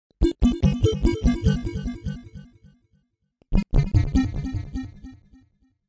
spacey techno twiddles, synth and electronic loops